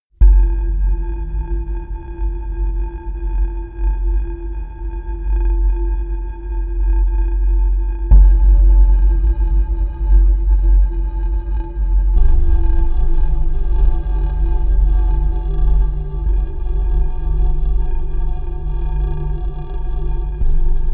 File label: vibrating marbles, music elements